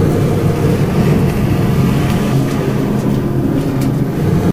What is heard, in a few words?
metal box scrape smooth loop; Source Engine; video game; physics